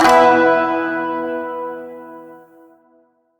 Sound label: Shawzin Chromatic Chord H; Chords; Warframe